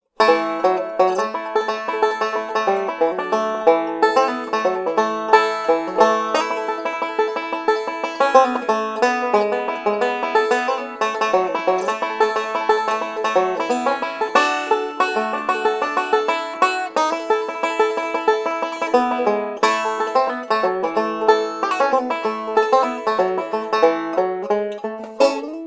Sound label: banjo test